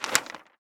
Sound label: cover turn